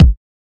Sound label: wet kick sample a sharp key; kick drum samples